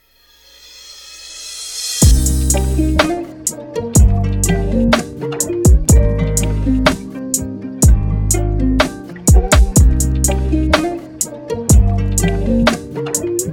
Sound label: Rnb Drama; drama based music trailer; drama based music trailer sync licensing